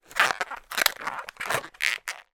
foley balloon little inflated squeak movements

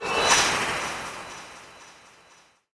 Transition Metallic; bottlerocket

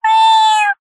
animals cat kitten meow; zapsplat

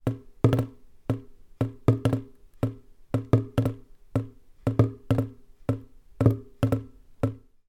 water drip into empty plastic bucket

